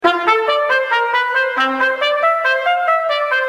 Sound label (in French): trompette